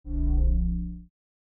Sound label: space shield sounds